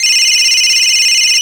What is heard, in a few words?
phone ring